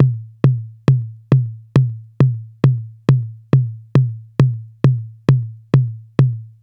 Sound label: lo tom, raw